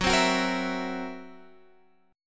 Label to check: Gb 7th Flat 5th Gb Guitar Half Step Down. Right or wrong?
Right